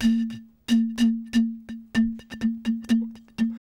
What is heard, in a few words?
bottle, ethno, 125